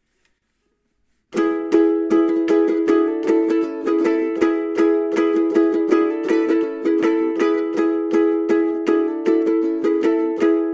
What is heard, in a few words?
Riff, ukulele